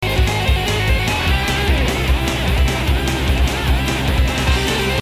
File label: Game Tune